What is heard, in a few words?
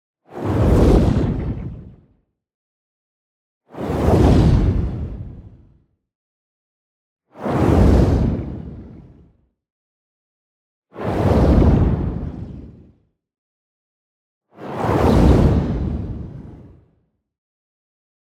WHOOSH LARGE Diffused Air; boom library; Matter